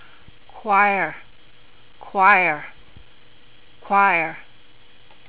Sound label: choir